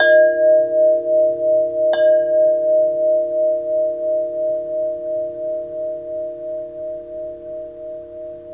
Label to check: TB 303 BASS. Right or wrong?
Wrong